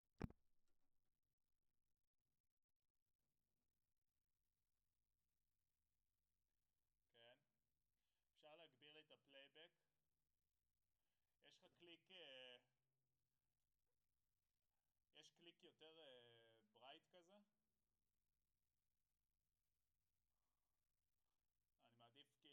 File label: SNR Top